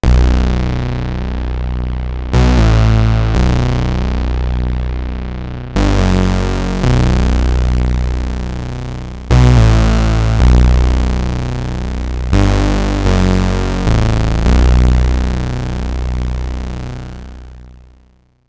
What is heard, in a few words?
bass moog taurus; arturia origin; Minimoog; basses; du gros, du gras et du lourd